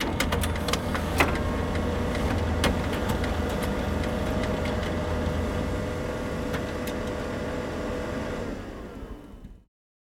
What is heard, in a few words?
Photocopier Single